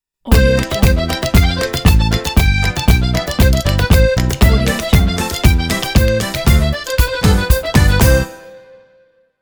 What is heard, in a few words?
country dance logo